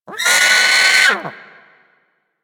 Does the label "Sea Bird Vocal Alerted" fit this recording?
Yes